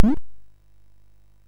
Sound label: sid MOS6581R3; Gremlins 2-The New Batch